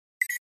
Flash Beep Dbl Elect

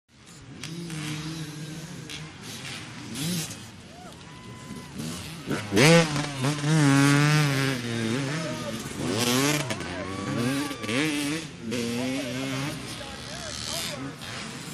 Motorcycle Race Amb, motocross

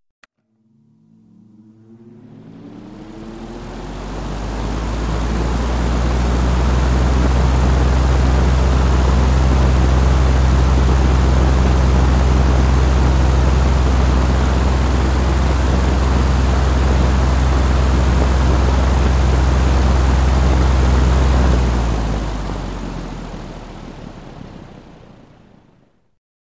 Electric Fan Modern; fans

